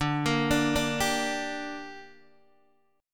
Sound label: D Suspended 4th Sharp 5th D down Guitar Open D, chord